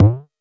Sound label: Bass bd, Jomox Airbase99